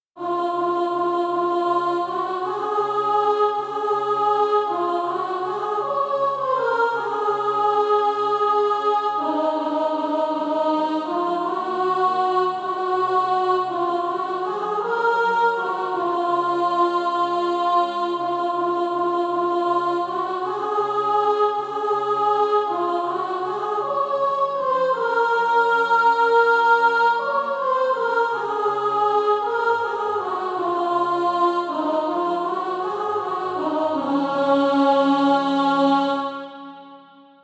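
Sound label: soprano, SATB Practise Music; Deeper and Deeper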